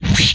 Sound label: Swipe